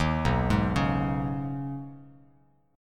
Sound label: B 6th Add 9th B down Bass Standard; B6add9 arpeggiated; chord shape; JGuitar